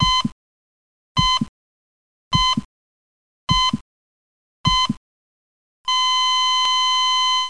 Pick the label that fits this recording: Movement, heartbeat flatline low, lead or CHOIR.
heartbeat flatline low